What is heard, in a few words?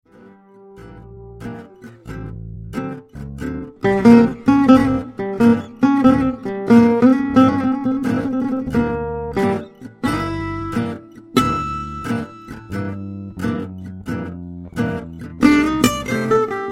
Minor Blues 2nd Chorus